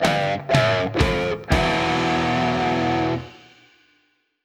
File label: Game Over; Super Dungeon Bros